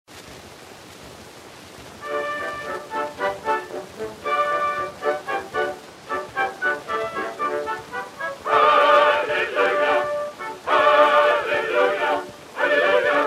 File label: hallelujah; Overboard!